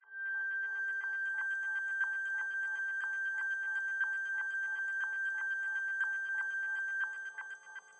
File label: pad